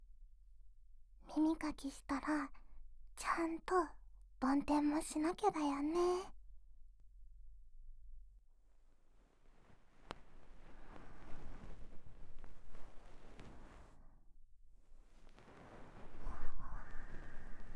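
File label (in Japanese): 梵天耳かき right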